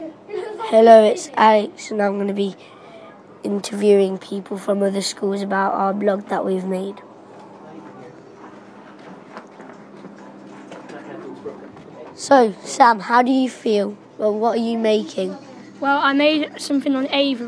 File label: shouting arghh